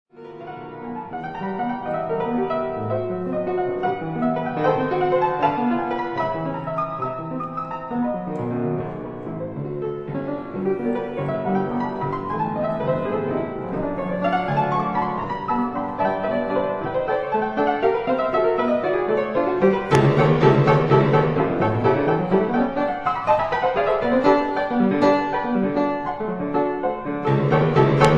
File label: Etudes for piano E minor